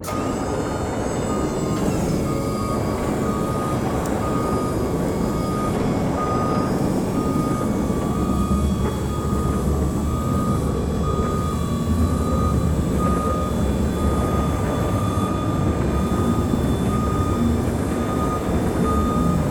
bridge moving

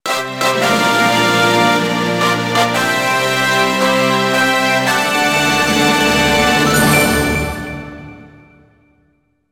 Cup Completed

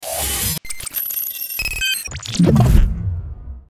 Glitch

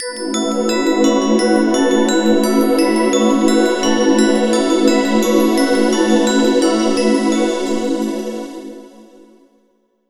Jingle, Panel Complete; Puzzle Swap; streetpass mii plaza; 3ds

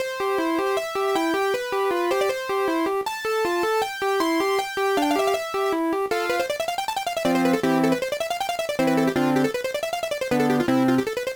BGM; three stooges; 2002 gba

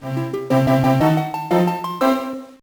Win Jingle; Shrek 2 Beg for Mercy; GBA

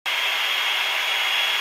Circular Run Loop

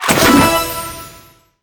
Chest Open; multiplayer kart racing; boom karts; android

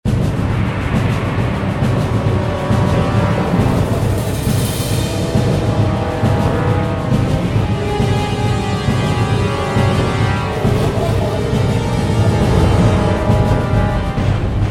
Battle Music; F.E.A.R. Extraction Point

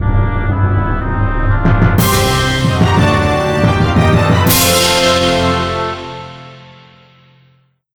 Heroic Jingle; Asterix Obelix XXL 2

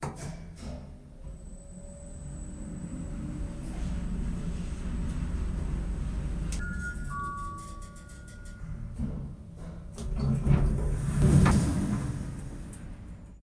elevator

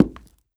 walk wood

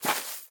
footsteps grass Walk

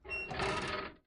Bridge Creak; Lethal Company

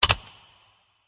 City Click; the great ottomans strategy battle for throne android

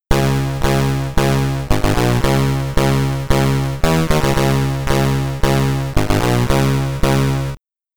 Complaining (SID Stereo); Kings of the Beach; Commodore 64

